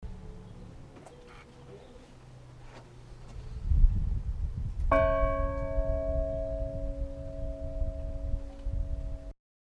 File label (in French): cloche; Dinsac